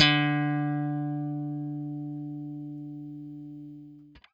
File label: BLUE POP, Guitar & Bass